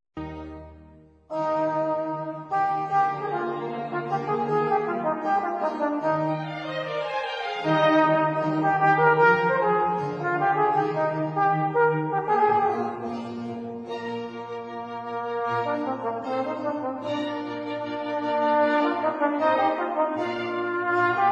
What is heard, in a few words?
trombone; western